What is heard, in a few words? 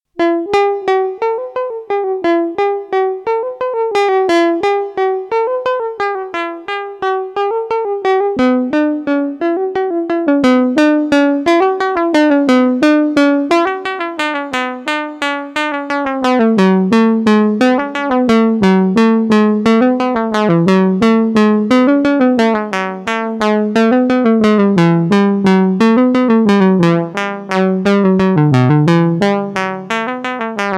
Axxe Pulse PWM